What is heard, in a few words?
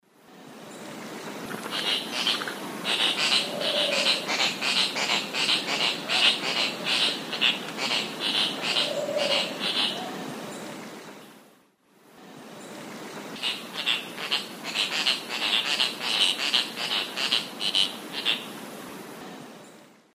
Tityra semifasciata, calls; S. America; Eastern Lowlands